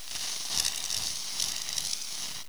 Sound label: SPRAYCAN